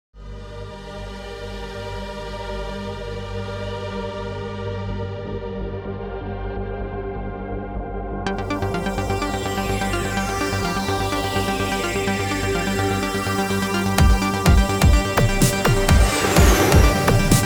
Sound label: JET SAX